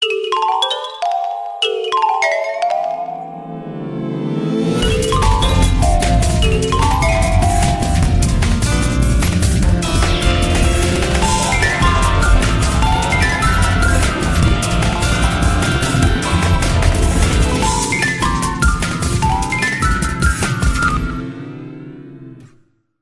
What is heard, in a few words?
oppo reno6 pro snapdragon ringtone